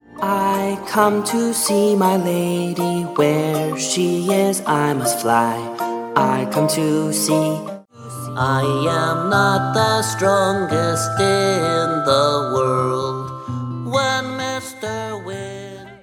영어남 동요; 남자

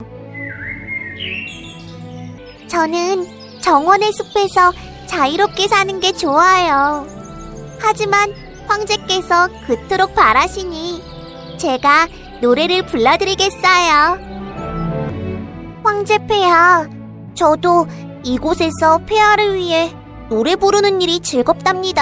작은새

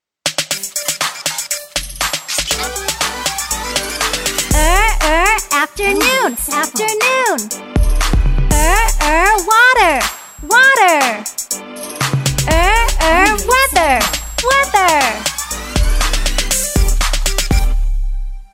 영국여 Chant Sample

